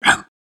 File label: barking, creature